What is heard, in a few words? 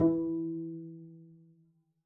pizz e3, double bass